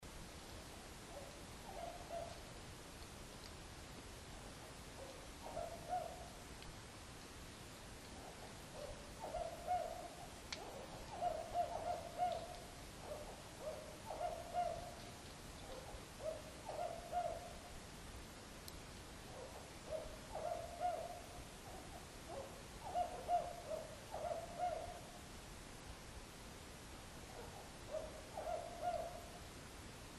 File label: Strix fulvescens; S. M. Papalo